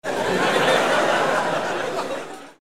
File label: laugh